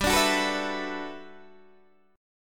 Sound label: G Major 13th, Guitar DADGAD